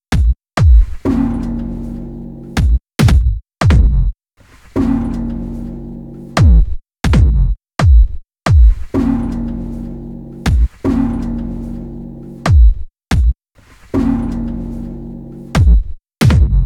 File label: drumsounds; noisebeats; icdiffusion; zahlenkomposition nr 3